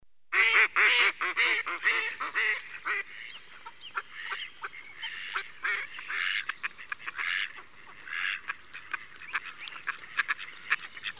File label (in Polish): kaczka; czterolatki; karty aktywnosci